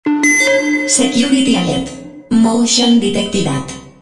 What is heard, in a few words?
music box failed; zerosiki; eltra